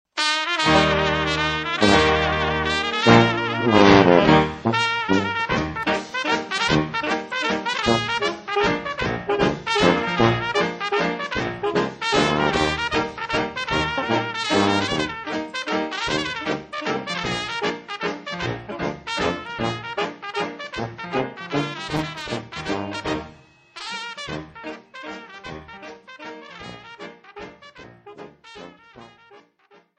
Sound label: cesky laendler